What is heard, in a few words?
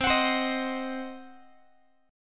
C Diminished, Guitar, chord; strummed; JGuitar